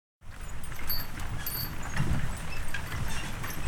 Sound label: Horse Drawn Wagon; Electa Quinney